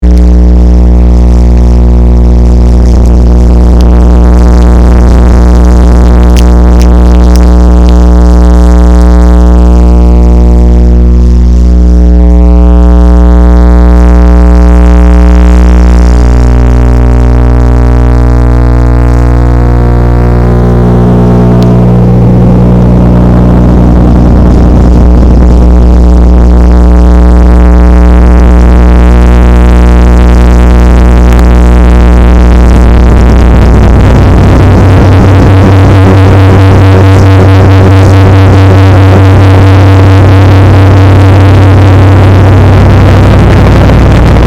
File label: noisefest; disjunct pdx noisefest